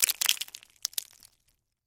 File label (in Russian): zvuki vaflei